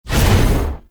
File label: Hammer Wind Up; Warframe; Veilbreaker; Sprag